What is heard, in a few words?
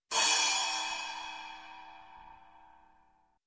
Soft Crash